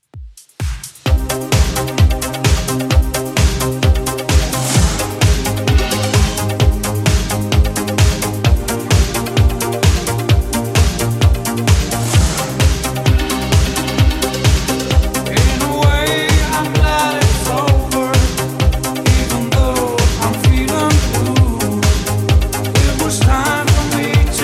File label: 80s Redrum 130bpm